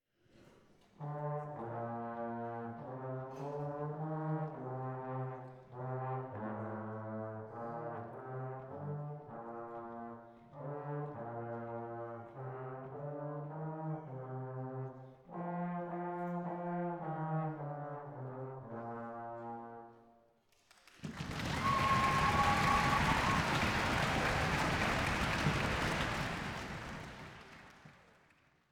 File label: Trombones